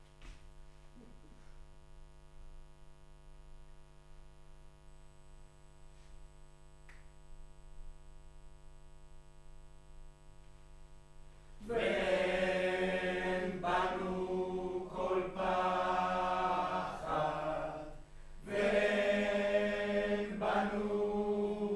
Room, Choir

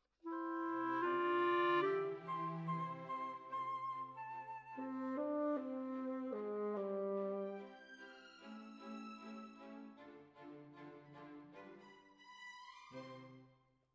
Clarinet

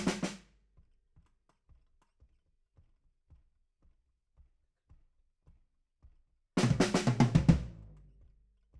BD Out

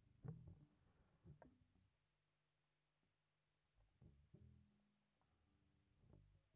Bass DI

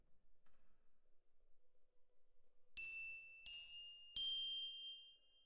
Glock Close, Jazz for piano

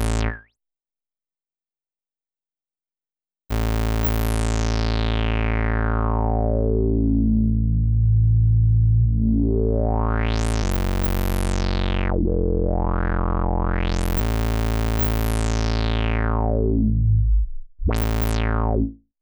bass

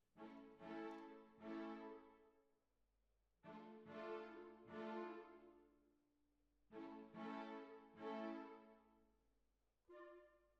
Cbasses

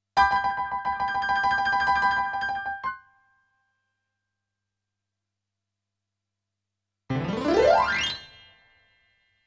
PIANO, RNR for piano

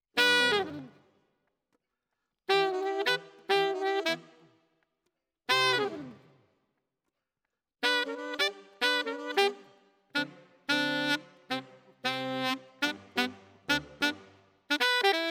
Alto, RNR for piano

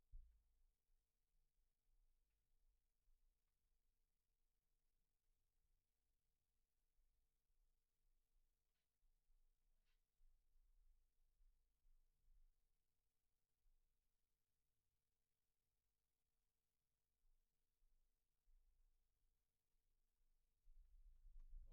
Kick In

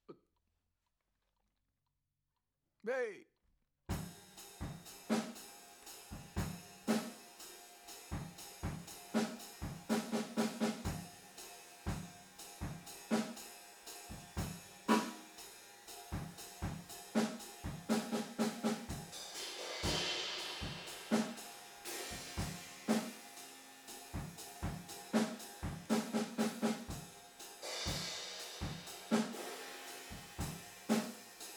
TB GTR

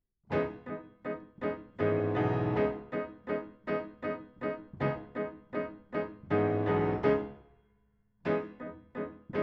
Piano A

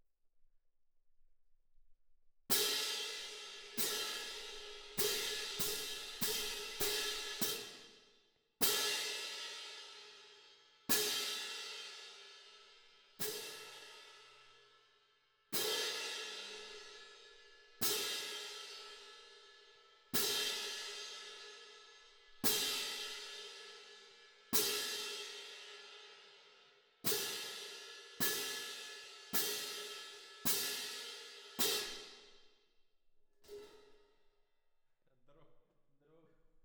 Cymb1 Main

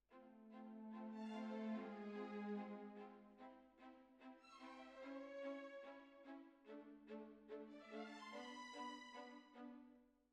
Oboe

